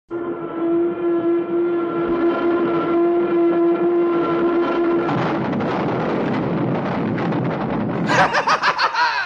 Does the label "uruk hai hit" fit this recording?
No